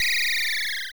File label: Laser Shoot